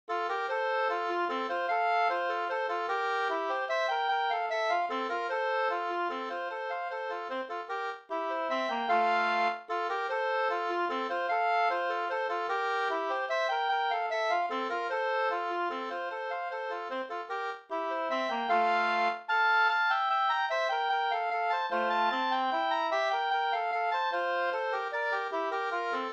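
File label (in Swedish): vals, two-part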